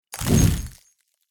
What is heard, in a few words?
ice arrow; foley